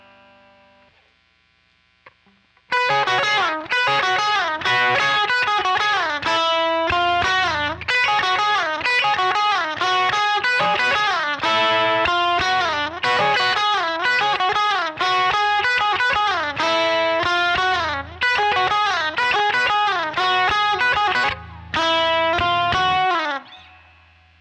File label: quilter solo